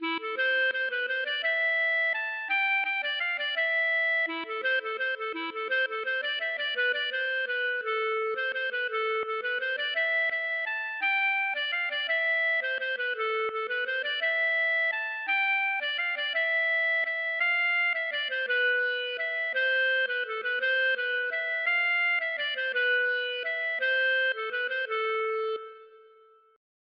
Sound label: mazurka